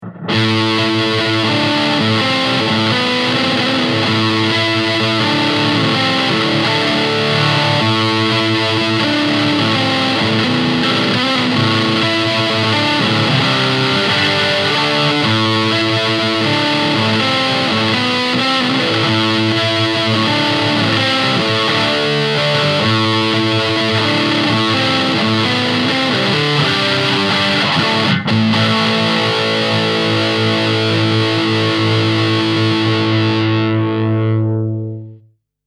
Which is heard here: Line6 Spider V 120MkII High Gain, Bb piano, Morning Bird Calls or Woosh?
Line6 Spider V 120MkII High Gain